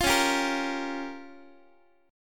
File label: Db 6th Flat 5th, Guitar; Db6b5; strummed; x,x,8,11,11,9